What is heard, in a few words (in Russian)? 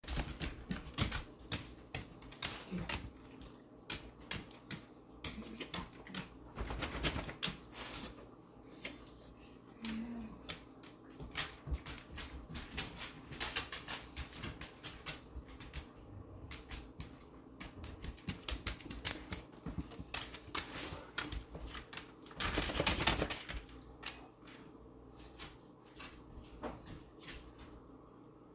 voj sobaki; nachinaetsya primerno s 33 do 40